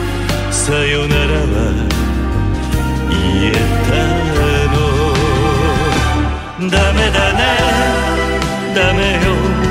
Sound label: music not copyright